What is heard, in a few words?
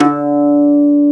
UKULELE D3; AKAI S-Series CD-ROM Sound Library VOL-7